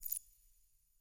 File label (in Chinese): 眼眸花开放声